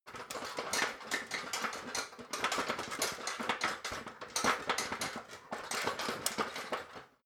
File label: ladder metal rattling long